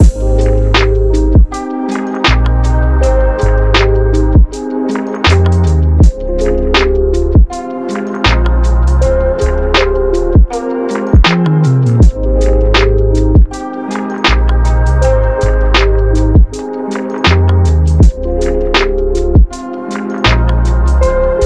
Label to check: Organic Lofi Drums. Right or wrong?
Right